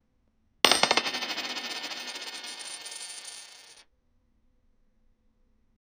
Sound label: currency